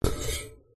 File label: robotical touch hybrid mobile collect special power up buff; epic stock media